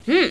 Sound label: hmm